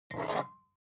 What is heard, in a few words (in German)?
bottle vodka pick up slide hard, gastronomie